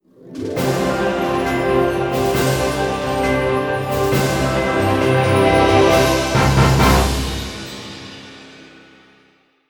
Victory; Victory (Alternate); Alien Hominid

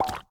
Brain Bounce

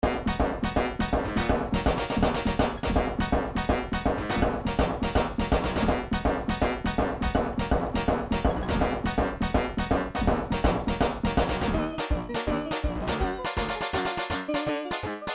Woodguy Battle; heart chain kitty

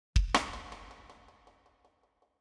Town Intro Drums; backpack hero gamerip 2022

